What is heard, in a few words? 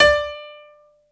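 piano D4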